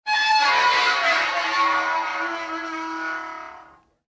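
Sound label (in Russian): металлические ворота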